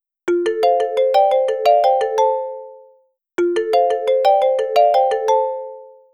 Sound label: Starfall; INOI 2 Lite 2019; ringtones